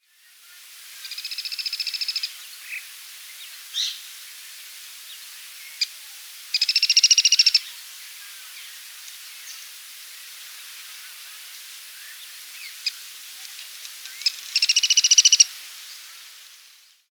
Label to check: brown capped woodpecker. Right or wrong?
Right